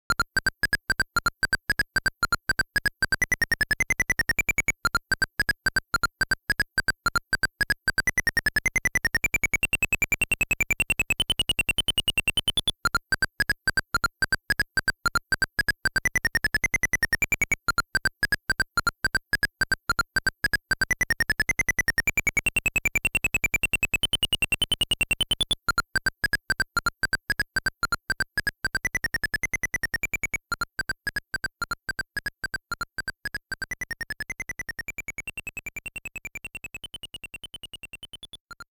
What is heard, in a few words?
Battle; Giant Character Battle; Xanadu